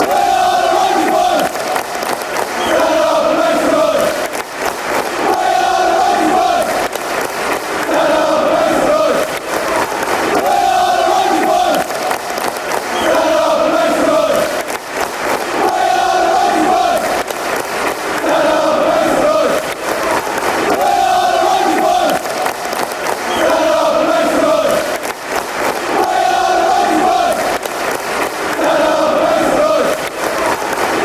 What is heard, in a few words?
we are the rangers boys, fan chants